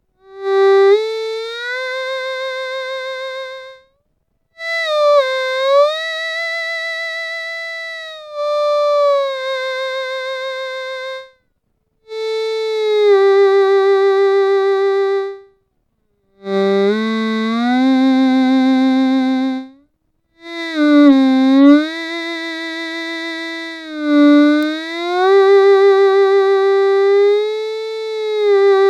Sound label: violin; theremin